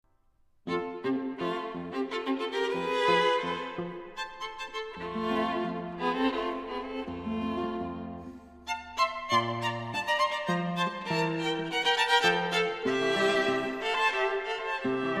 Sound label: for violin and guitar; paganini; variaciya3 barucaba soch14